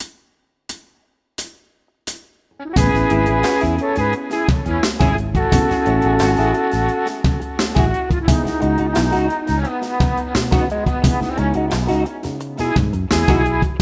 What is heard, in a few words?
film music